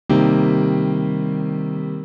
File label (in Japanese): マイナー7th; guitar; chord